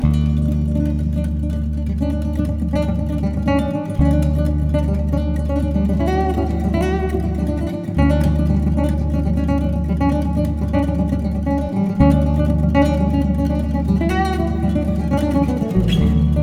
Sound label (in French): Fin Guitares Basse